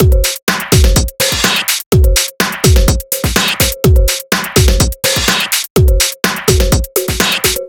Breakzone B, break beat

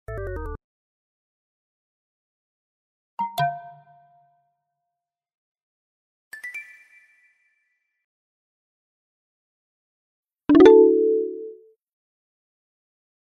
notify

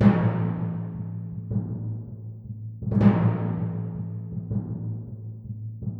Timpani, movie

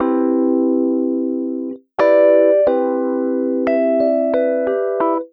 Tremolo; Сэмплы клавишных (Легкая музыка); 90BPM; Easy Listening; Keys

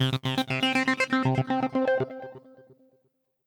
Echoes, jazz

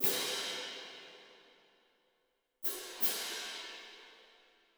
Cymbals; Сэмплы ударных для фильмов: Cymbals C; Beat; Drums; film music; Барабаны; Музыка для фильмов; Ударные; Movie Score; 80BPM

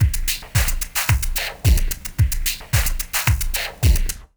Sick Snare, soul

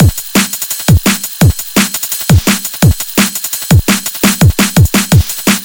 Fresh Beat B, drumbass

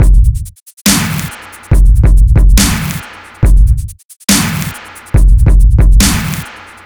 Hall Hard, 140 bpm

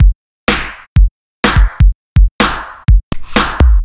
Bell Kit, ударных брейкбит; сэмпл ударных

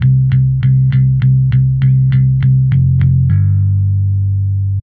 Charisma Bass, бас сэмпл метал

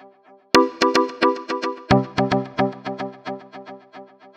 Percussive; Сэмпл синтезатора (RnB Soul); 110 BPM; loop; Synth; ритм-н-блюз

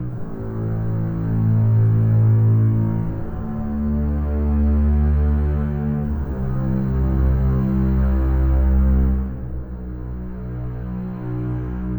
Bass; Сэмплы струнных инструментов для кино; Movie Score; 80BPM; film music; Strings

